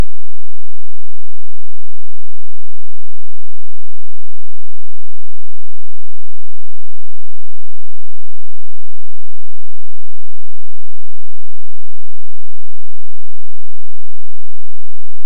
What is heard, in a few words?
bass lead